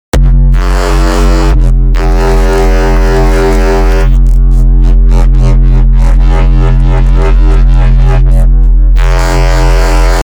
deep neuro wobl

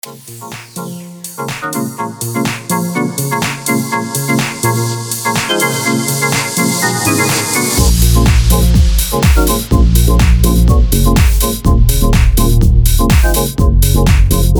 garage house

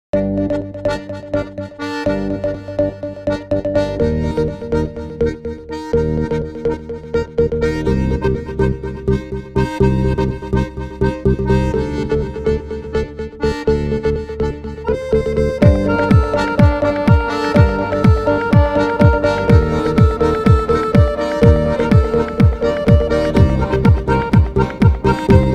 instrumental

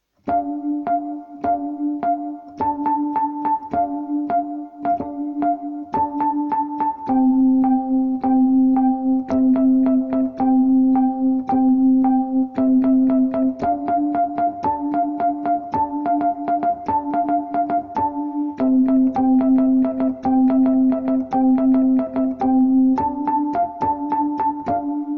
Spontaneous on synth

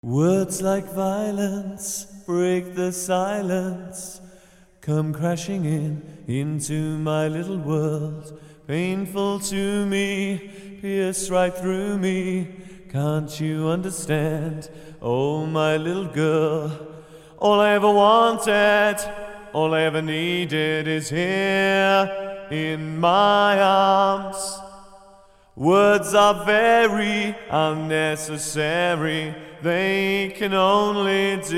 Studio Acapella

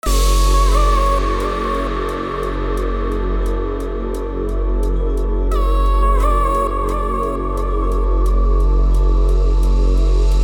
DNB

